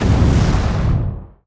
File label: firestorm start; underlord